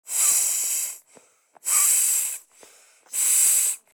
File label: Rubber ring inflation blowing; inflatable